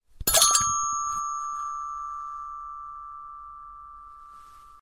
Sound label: nokia ringtone